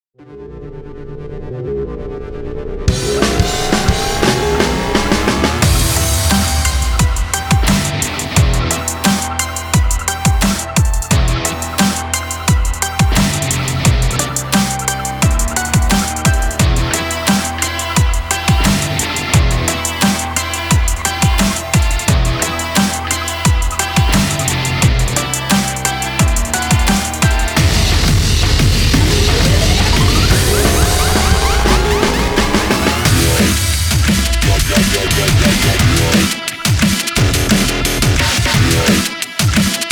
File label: Boss Room; Heavy Heart